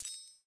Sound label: Money; Game rip; MiSide